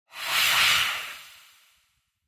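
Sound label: Breath Demon Exhale, scary voices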